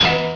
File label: Gamelan, Gong Kebyar; Jins Gamelan Schemes2.0